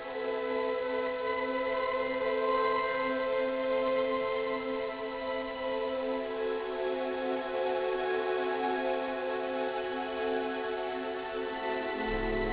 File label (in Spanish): misterio